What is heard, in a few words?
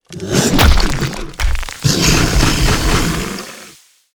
lancer satk; monster